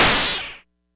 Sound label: Cwejman S1; A-Stash; DevSnd Cwejman S1 - RD